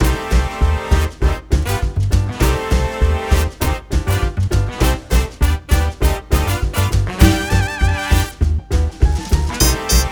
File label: big band